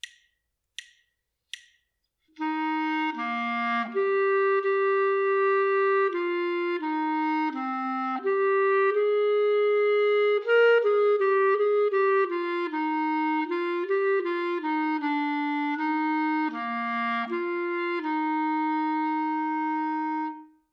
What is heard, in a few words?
melodic error detection; ear training